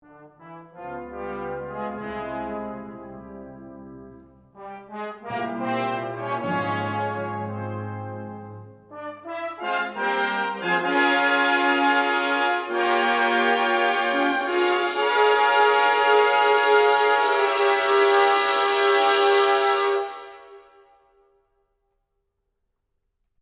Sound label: Fanfare